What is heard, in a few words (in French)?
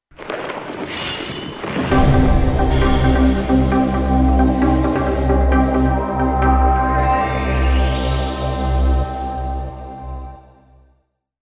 VIRGULE TELE ACHAT